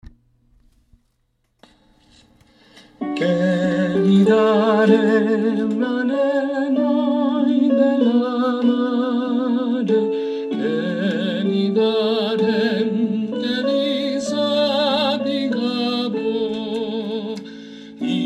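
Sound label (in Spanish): El noi de la mare soprano con voz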